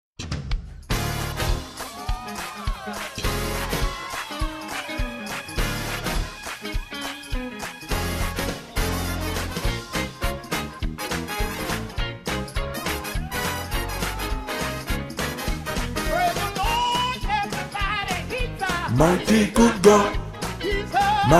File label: Mighty God Basse